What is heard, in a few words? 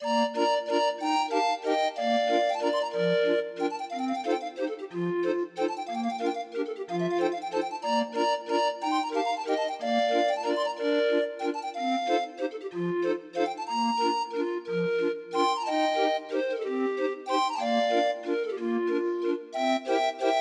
Grind Organ